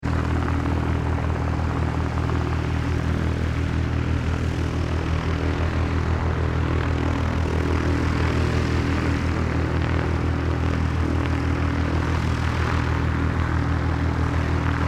ambience airfield prop runway; Airfield / Small Airport Ambience - Light Aircraft Queue Up To Takeoff; Backgrounds

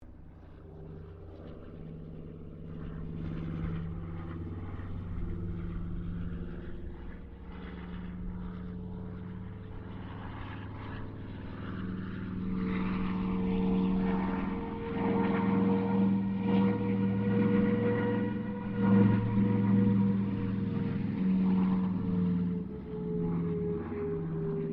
AIRCRAFT CA-13 BOOMERANG PASS DISTANT; Airplanes

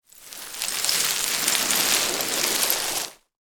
Rocky Hillside, Footsteps, Slide, Down

